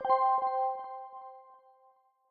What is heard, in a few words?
second chance; Skillzgaming; gems odyssey desktop